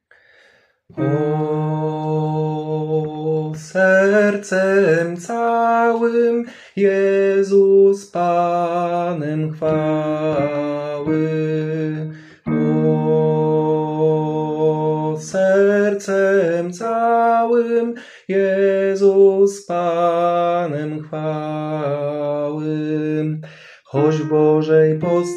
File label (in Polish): Tenor; s╠upiewaj s╠upiewaj; nagrania glosow